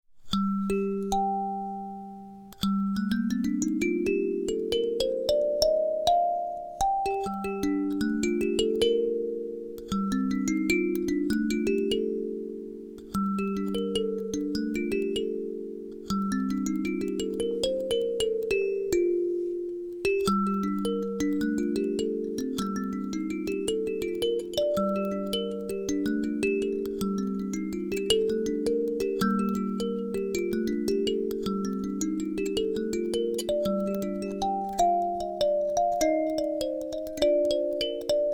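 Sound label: Alto Cel G minor, kalimba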